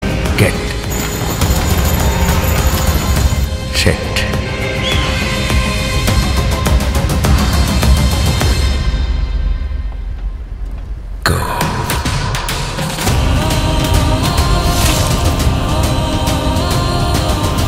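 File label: background music; Nani; HIT 3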